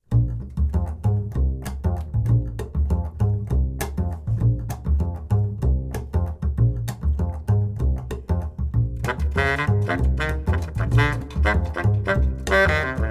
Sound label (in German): Sonderbarer Samba